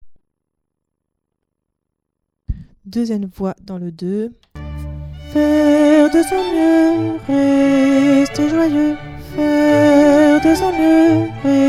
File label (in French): 2 voix; FAURE BENEDICTUS; HAYDN 2 (voix 2)